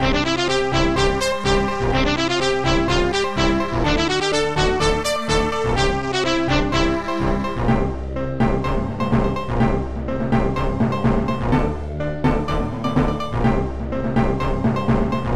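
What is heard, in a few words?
Fanfare; A New Adventure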